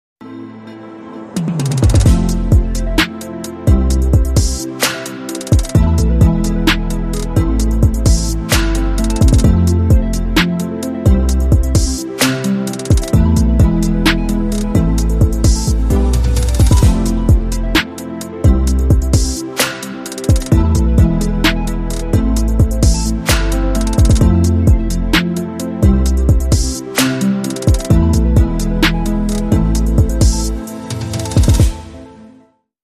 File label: Pumping Keyboard Short Rhythm